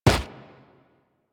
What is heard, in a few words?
Walk Dirt, Footsteps; Warframe; Excalibur